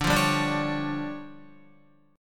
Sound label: D Minor Major 7th Double Flat 5th D Guitar; strummed; chordshape